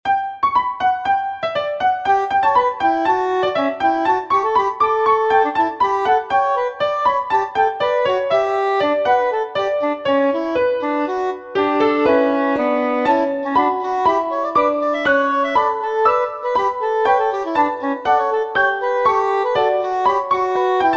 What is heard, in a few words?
trio; idiosyncratic